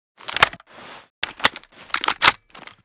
vsv load; metro; weapon; unload

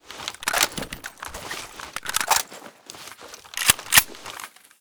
saiga load, weapon

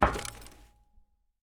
metal footstep

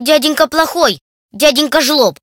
child

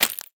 glass footstep

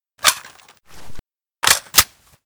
kedr load, weapon; metro

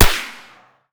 bizon silencer, weapon

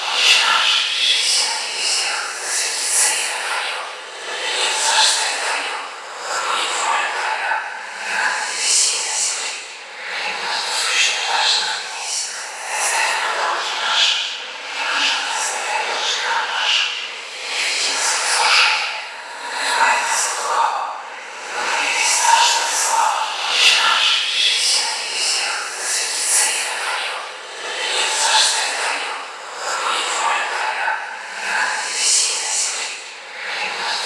ghost; npc

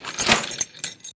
metal Crate Close

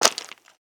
stone footstep

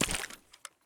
water footstep

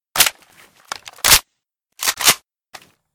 kiparis unload, weapon; metrocraft

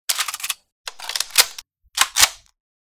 ak load; ak105; weapon

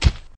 guardian walk

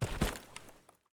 grass footstep

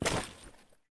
gravel footstep

